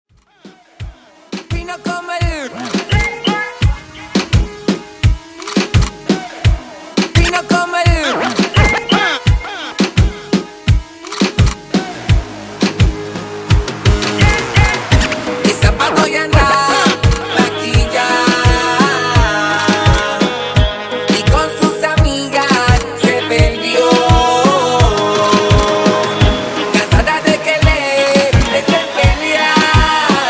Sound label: Party Reggaeton 85 Bpm